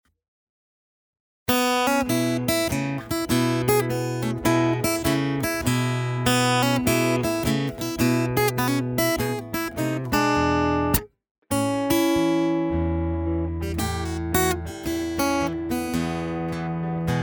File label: blues